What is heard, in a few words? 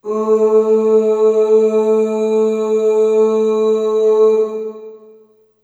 Ooh Long A2 Short Reverb; Harmonic Building Blocks